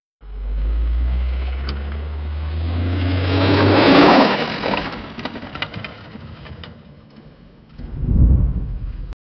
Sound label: sound effect the sound of, weather